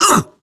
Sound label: Pain; Warframe; Grineer Catbrow Handler; Battle Barks